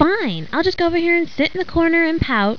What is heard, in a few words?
pout